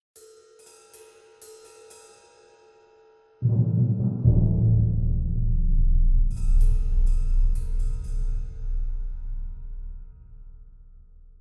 Ambient

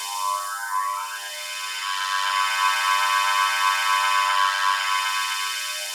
Jauge Loop Long

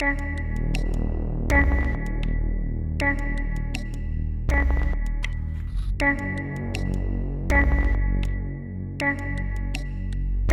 stem outro; Pix the Cat

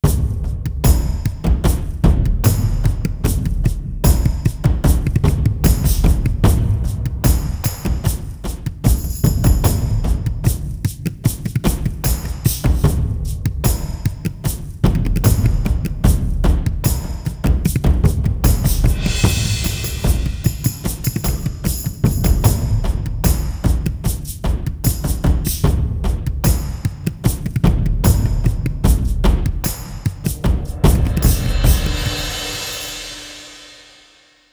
Perc Scotsmen

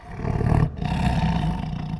tiger turn; Ringling Bros. and Barnum & Bailey; Wii

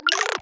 chargeup gun; gonner

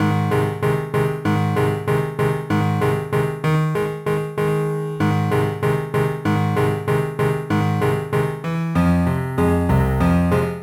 BGM PIANO

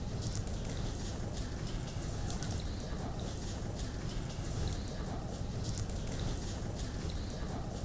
torches in cave; gamerip

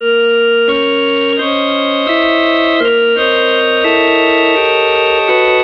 organ; Left 4 Dead 2

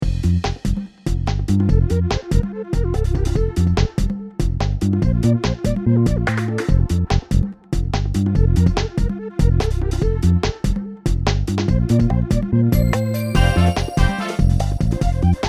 Music Level; Dream Tower

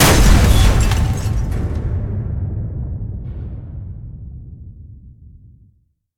Explosion end

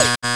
Minigame Lose

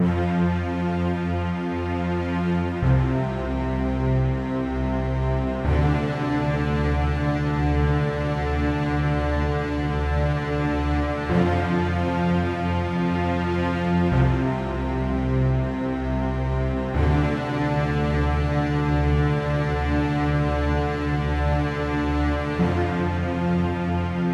Loop Fade Nobase; King Lucas

